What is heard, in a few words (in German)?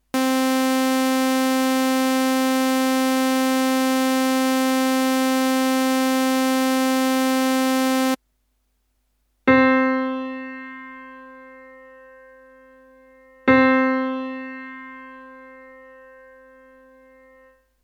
prime; harmonie